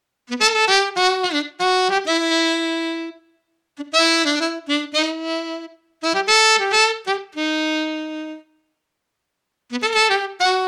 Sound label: Sax, Samplemodeling Isolated; Megaman X